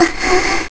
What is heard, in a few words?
Inhale; Zelda inhaling